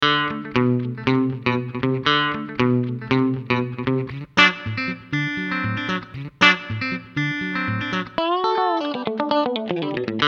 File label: GUITAR BEFORE TUBE; VTC TUBE BOOSTER; TUBE INSTANCE